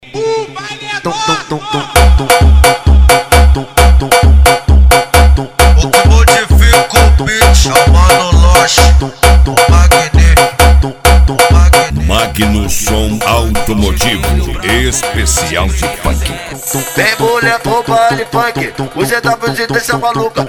funk

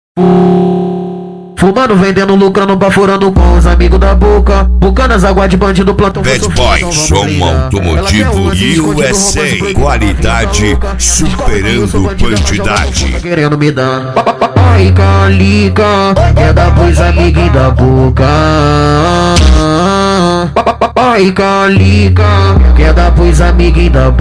especial funk; cd bad boy som automotivo usa

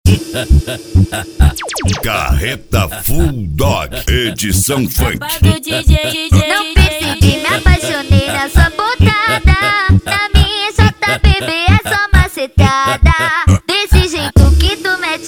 funk

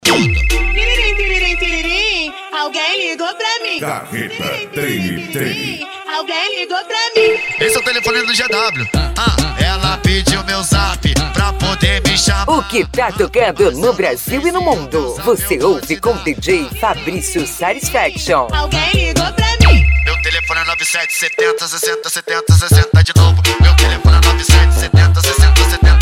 funk mega bass

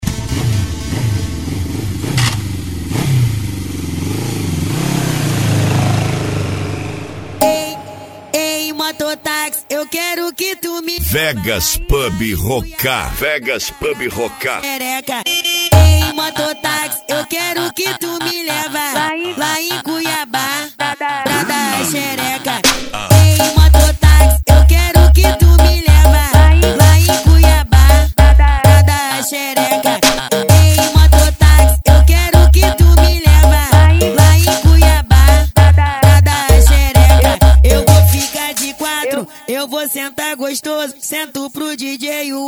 funk mega bass

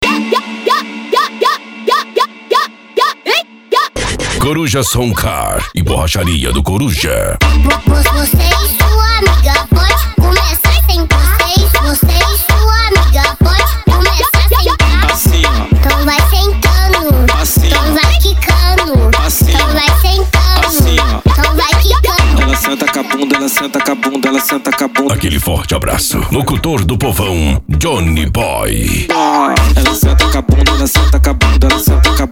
funk; coruja som car e borracharia do coruja

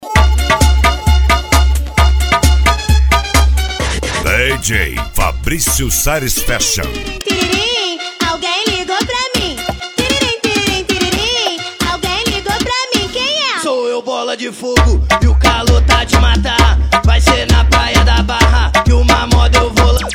funk

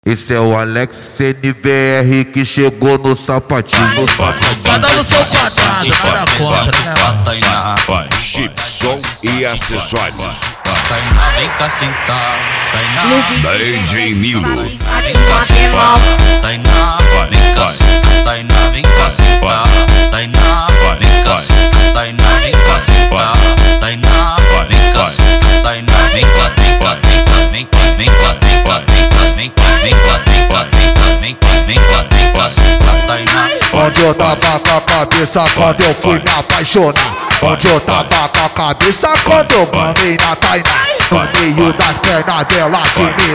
especial funk

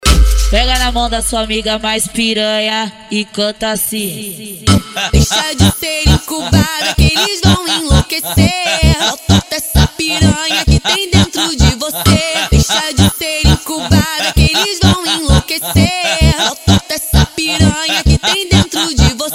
funk

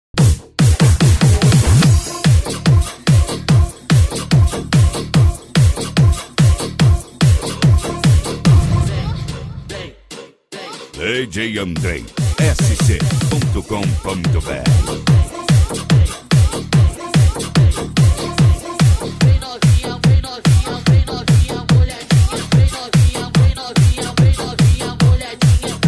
pancadao funk bass

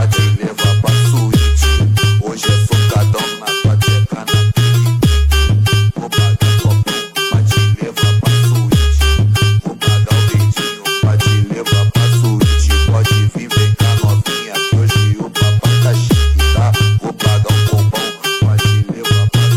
funk bass

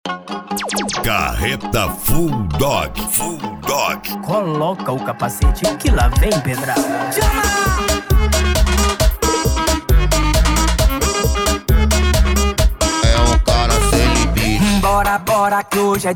funk

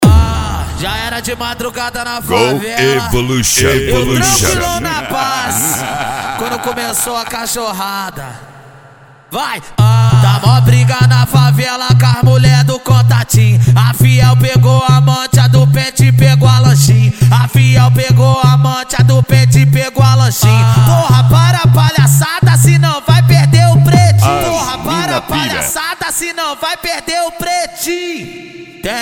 funk tumdumdum funk bass

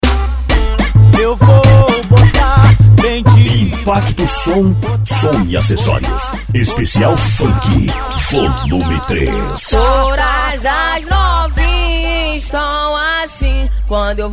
funk bass; impacto som

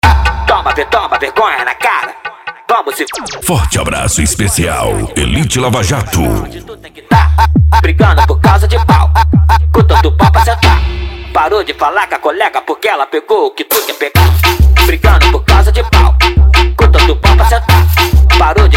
funk mega bass

